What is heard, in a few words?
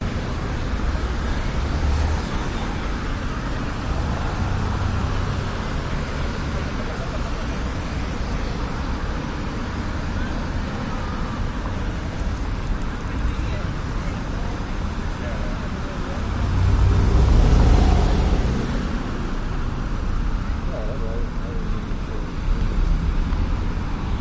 vancouver night traffic hissing squeaking rumbling roaring traffic; dunsmuir; cars engines; your free sounds